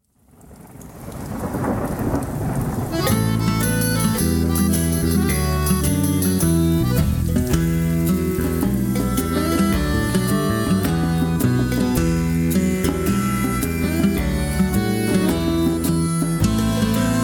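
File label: instrumental version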